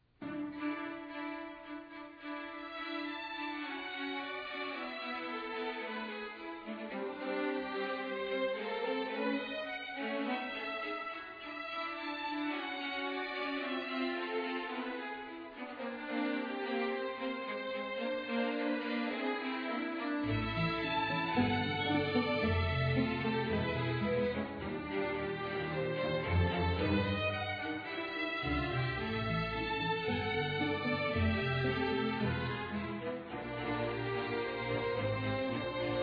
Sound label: Serenata I Strings; Serenata I for Strings, op. 24b; CBC Vancouver Orchestra, conductor Mario Bernardi; Derek Healey